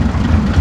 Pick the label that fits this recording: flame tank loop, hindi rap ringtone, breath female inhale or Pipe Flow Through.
flame tank loop